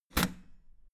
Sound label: old button harsh push hard